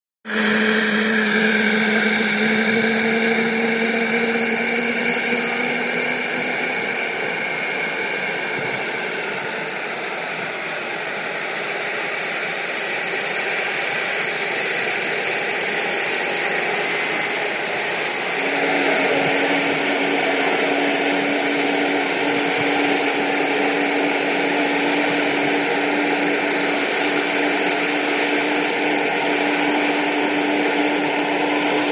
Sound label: Gazelle Idl Liftoff A, aerospatiale